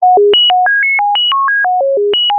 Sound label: science fiction robot beep